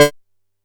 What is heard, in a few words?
D4, Bass; E-MU Producer Series Vol. 6 – Analogia Project; Boom da Bass